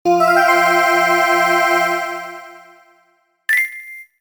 Boot; Ritz the Rat

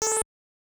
laser; Gradius; MSX

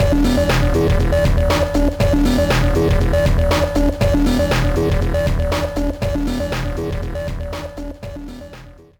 BGM; minna no soft series tetris advance 2003 gba

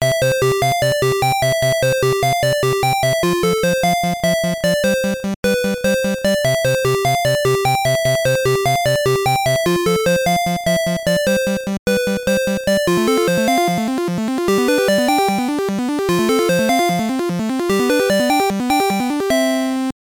All Clear; Alleyway; GB

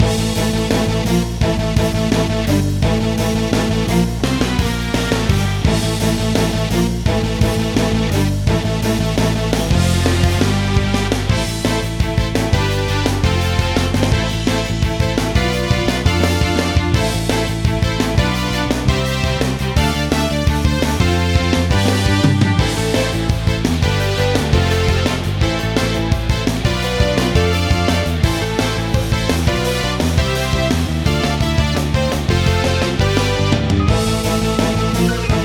Battle; Nexomon: Extinction

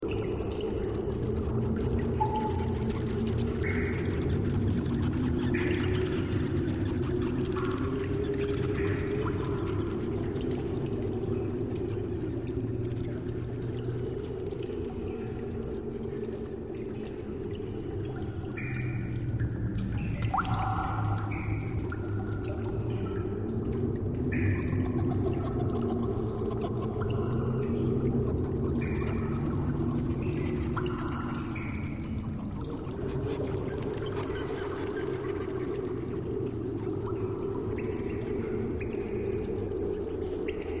Ambient - Cave; Bad Piggies

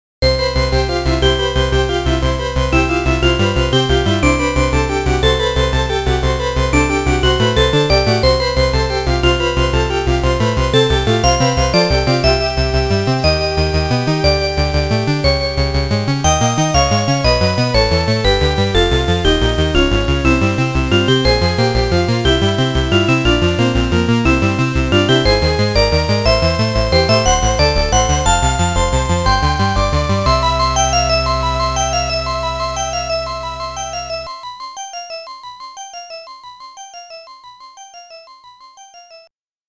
All Pattern Clear; Salamander; TurboGrafx-16; Peace Again

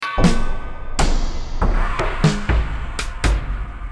Drums; More Hylic Drums

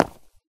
footstep concrete land; ProSoundCollection v1 3

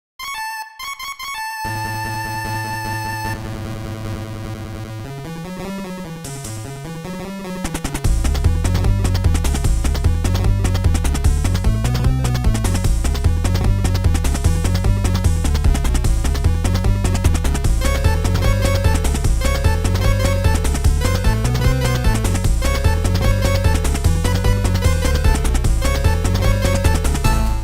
Gloo Gloo (8-bit)

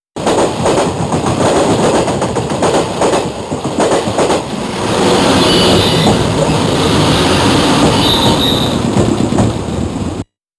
SE (TRAIN); Capcom Belt Action Collection

